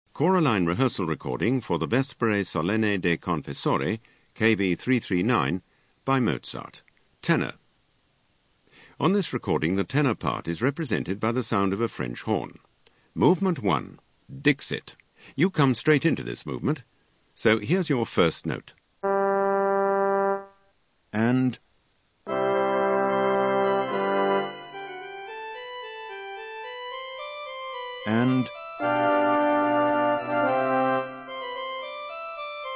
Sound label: Tenor; Mozart Vespers